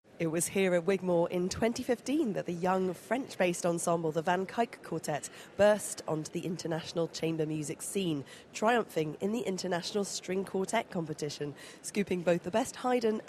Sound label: String Quartet In E Flat; Introduction To Schubert's String Quartet In E Flat